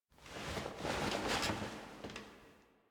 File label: Apartment Foley; Warframe; Zariman; HubApartment